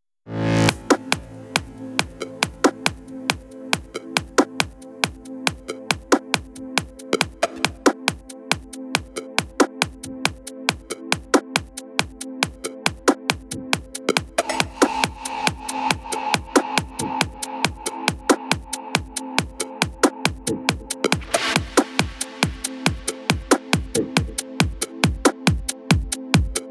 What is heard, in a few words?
Deep And Pumping Sound, techhouse